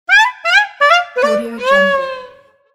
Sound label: cartoon fail trumpet laugh